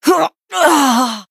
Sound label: Female Human Death